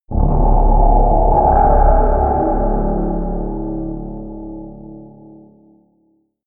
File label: scrapes tensing scariness, drones